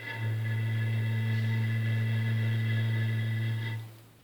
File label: wine bottle